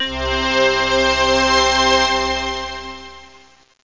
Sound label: bell strings, keyboards; Zoom - Release 2; Active Software